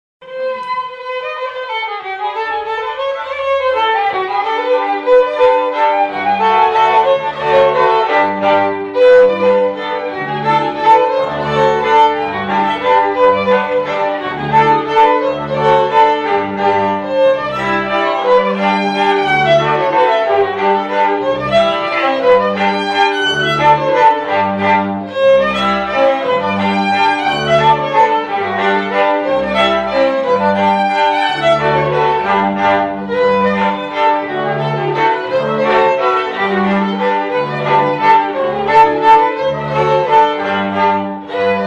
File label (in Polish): Kapela polka rzeszowska